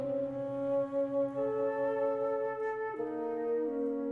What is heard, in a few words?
Music Only; Electa Quinney